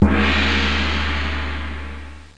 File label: Gong Boing; Kermis Jingles Diversen